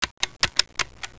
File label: button; blackops